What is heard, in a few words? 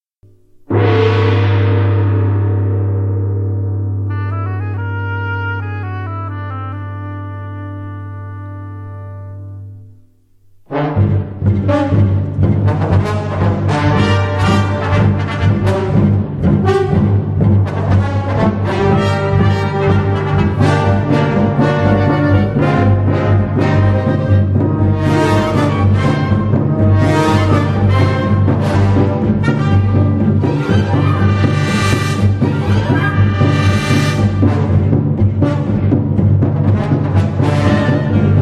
GONG; tarzan movie music